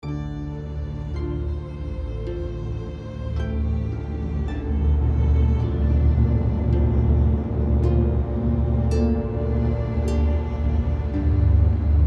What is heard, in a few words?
Neutral Loop; The Walking Dead: The Telltale Series